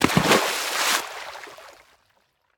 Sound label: splash; Scrat